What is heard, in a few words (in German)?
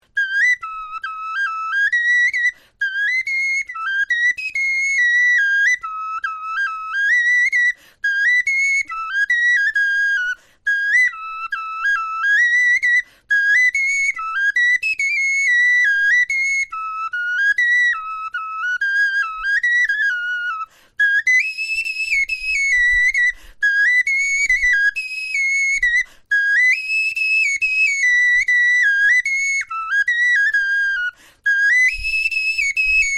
melodie, knochenfloeten